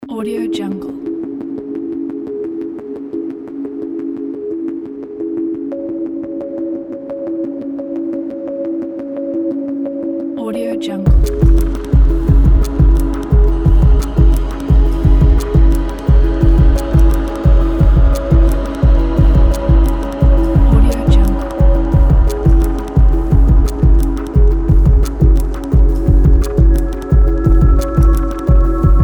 space drone music

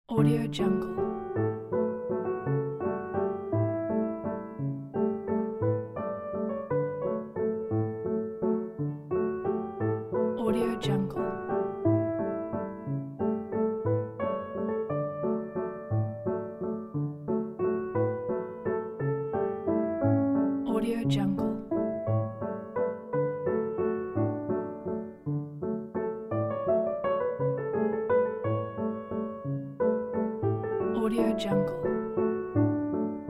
italian song

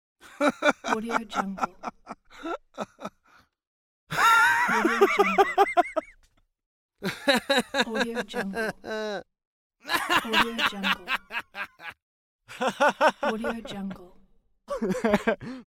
male laughter

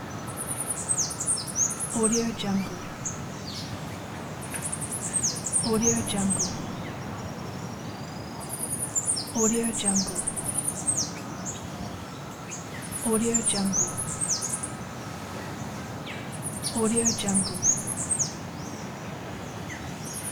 morning village birds

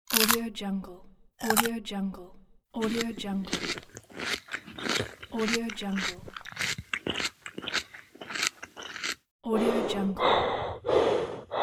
human pack

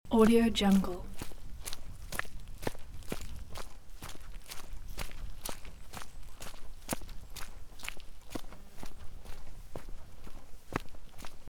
footsteps on muddy forest road

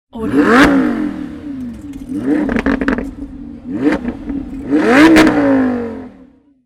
ferrari car revving loud

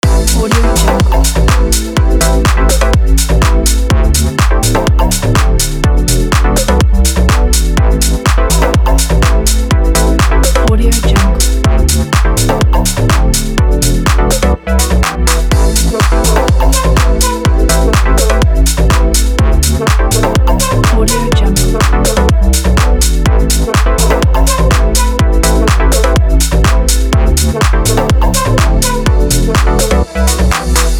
fashion loop

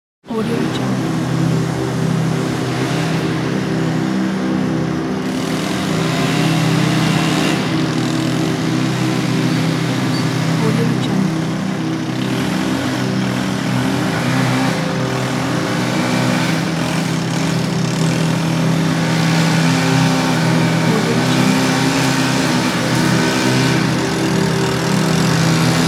gardening work

